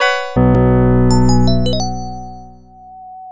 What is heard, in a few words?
Jingle Item Get